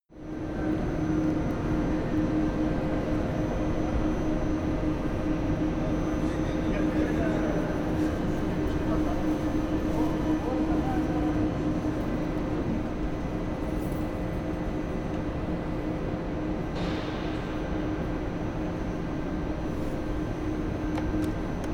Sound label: train station calm